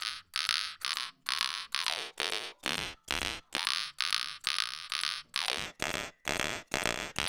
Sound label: SHELL, Ethno Techno; ILIO; BUZZHEAD 132